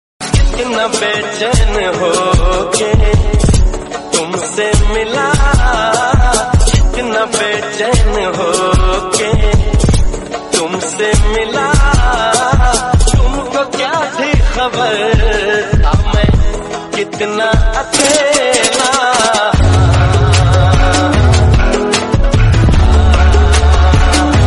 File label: hindi love